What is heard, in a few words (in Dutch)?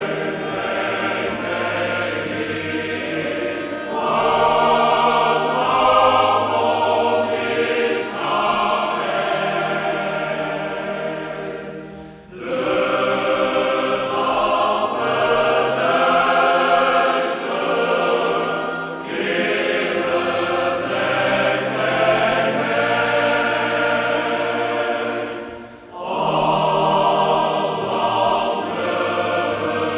Koor (mannen); Urker